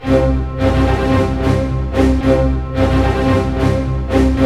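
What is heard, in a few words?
112 war, orchestra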